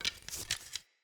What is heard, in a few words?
hunter bow unequip, enemy bows weapons